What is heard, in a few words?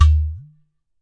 udu HIT, Udu Clay Hand Drum; ETHNIC and WORLD PERCUSSION